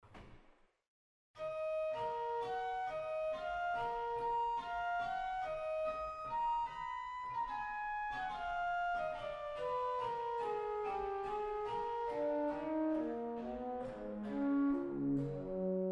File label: Floete Travers 8; waltershausen